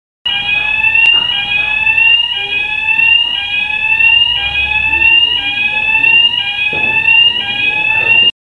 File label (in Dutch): evacuatie